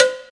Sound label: High Floor Tom One Shot C Key, tom drum sound tuned to the C note